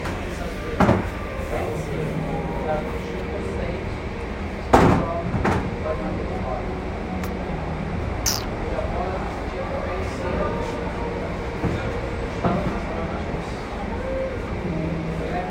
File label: Restaurant